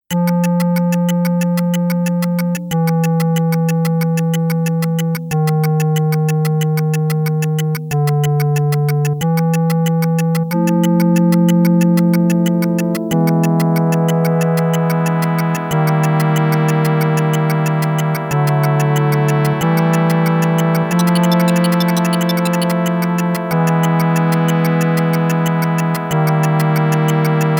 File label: Stage 4 BGM; Section Z; Section P~T